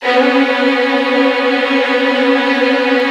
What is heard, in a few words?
VN TRILLS, Virtuoso Strings